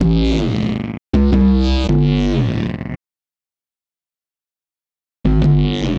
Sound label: Swoop Bass, Drum N Bass